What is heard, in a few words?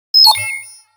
Futuristic tone authorization confirm